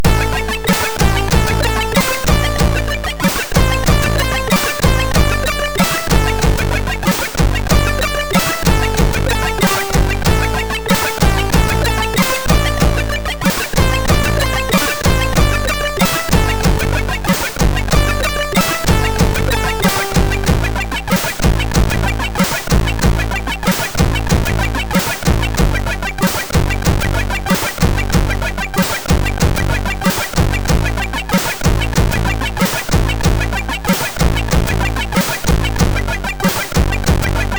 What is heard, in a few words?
Lullaby, sid MOS6581R4; hvsc